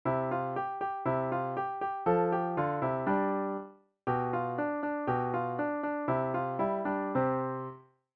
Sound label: Allegretto, klavier; Tuerk